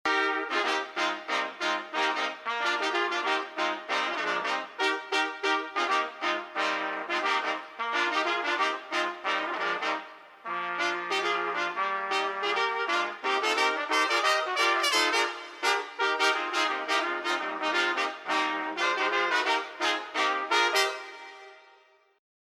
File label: deck halls tpt